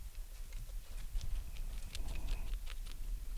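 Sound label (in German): Zähne knirschen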